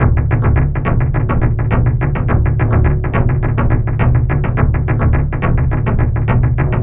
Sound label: Awed Tribal, Percussion